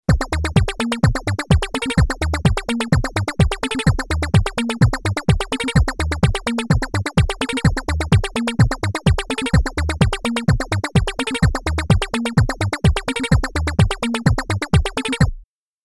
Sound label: Qun MkII Funky Acid Arp with Kick Bass; Nunomo